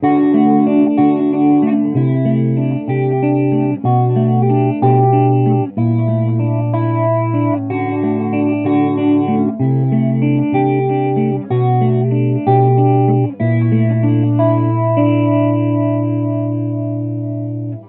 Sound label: picking